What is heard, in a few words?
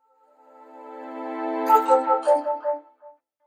Musical Transition Effect Tonal Pad Ambient Evolving